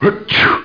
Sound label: Male Sneeze; Education Publishing Concepts